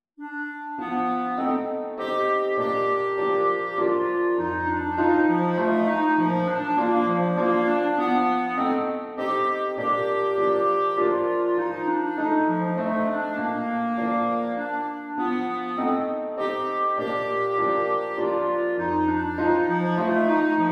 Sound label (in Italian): Clarinetto; Valzer Sentimentale Op. 50 n.17